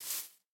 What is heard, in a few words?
Grass; Minecraft: New Nintendo 3DS Edition